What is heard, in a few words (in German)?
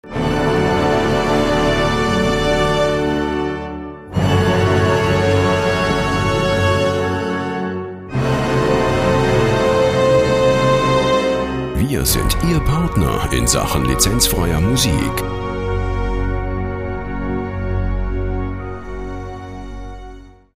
orchester